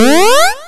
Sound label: achievement; mario